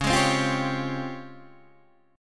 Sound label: C sharp Major Sharp 11th, Guitar DADGAD